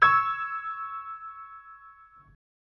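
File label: C sharp minor highest, chords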